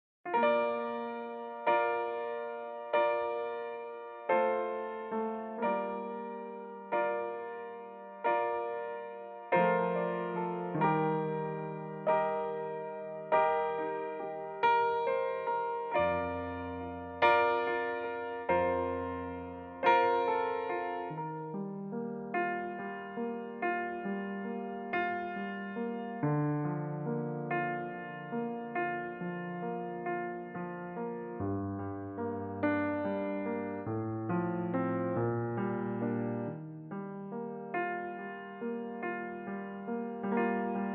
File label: piano